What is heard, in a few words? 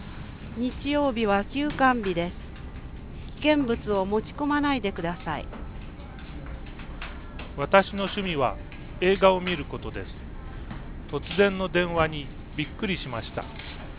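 office, noise canceller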